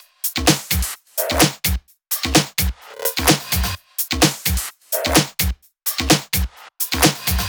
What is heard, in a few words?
128BPM Kit; Vengeance Samples; VFH1 128BPM Diesel Kit